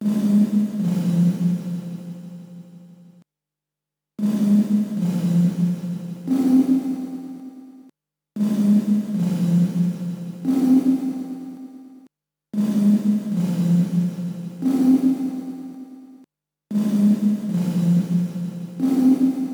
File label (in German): ASR10 Leisetrommel